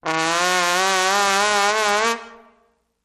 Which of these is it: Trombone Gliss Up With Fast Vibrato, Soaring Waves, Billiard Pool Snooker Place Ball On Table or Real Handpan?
Trombone Gliss Up With Fast Vibrato